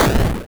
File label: soft, explosions